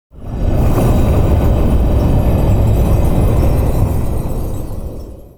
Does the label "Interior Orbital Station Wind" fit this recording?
Yes